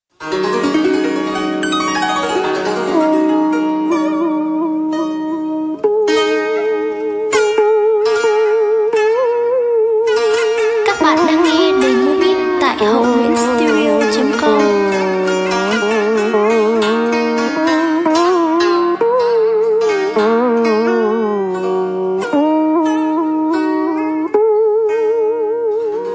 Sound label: beat, playback